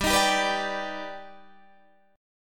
Eb Augmented Major 7th G

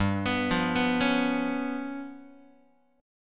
G 7th Flat 5th G down Guitar; arpeggiated; JGuitar

